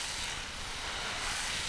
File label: loose snow; Quantum Redshift